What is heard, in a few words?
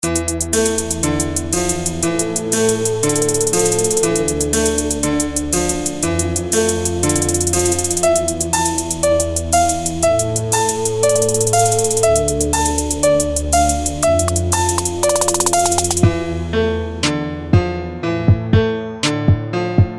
Trap Instrumental